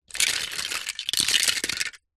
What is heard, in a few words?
toy cars metal pile movement